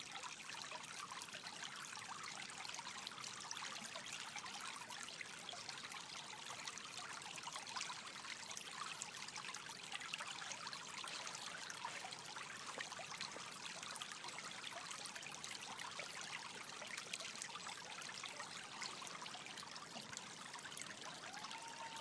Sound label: sound of small weir in elmdon park